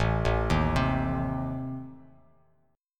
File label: A Diminished Bass Standard; 5,0,1,5; arpeggiated; JGuitar